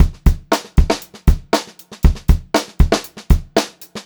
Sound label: POP VARI, Pop-Rock Drumloops; 118 POP